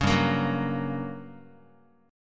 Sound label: G Minor Major 7th Flat 5th, G, down, Guitar, 4A 4E 5A 5D 5G sharp 6C sharp; strummed; JGuitar